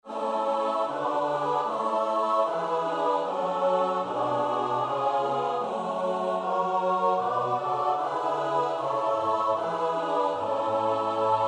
S-A-T-B, koorpartij oefening; Bach (Johann Sebastiaan); BWV 132

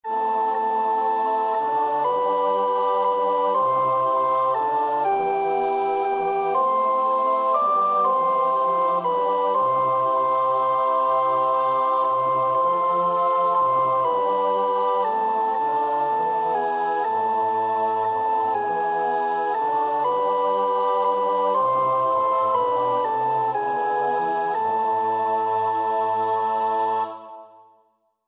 sopraan, koorpartij oefening; Praetorius (Michael)

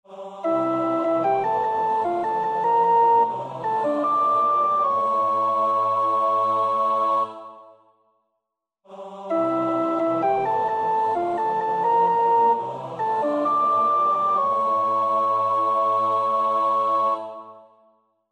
sopraan, koorpartij oefening; Schütz (Heinrich); Matthäus Passion SWV 479

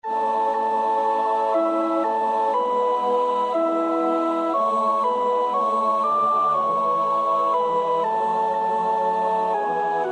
sopraan; Maunder (John Henry); Bethlehem; Deel 3 (Our gift)

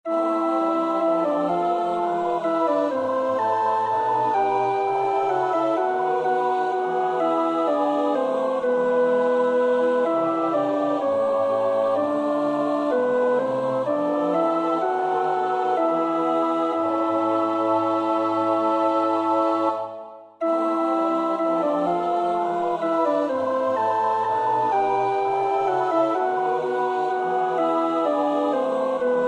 alt, koorpartij oefening; Bach (Johann Sebastiaan); BWV 179; Ich armer Mensch, ich armer Sünder

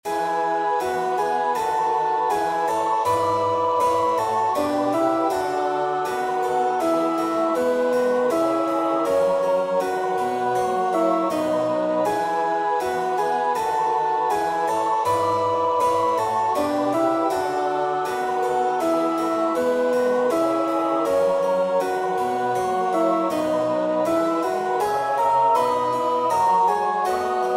alt, koorpartij; Bach (Johann Sebastiaan); BWV 009; 7 Ob sich's anliess', als wollt' er nicht

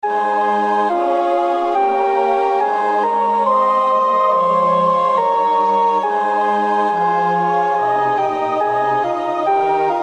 CHORALE koor alt, koorpartij; BWV 244 Matthäus Passion; No 10. CHORALE- Ich bin’s, ich sollte büßen (koor 1 en 2)

